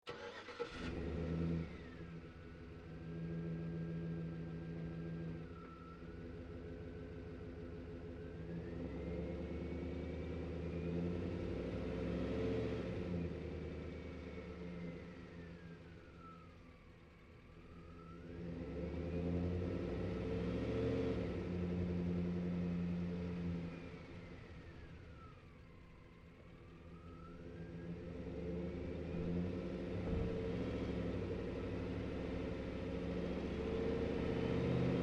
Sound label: Truck GMC Savana Onboard Drive Slow; 2010 GMC Savana; Vehicles; Trucks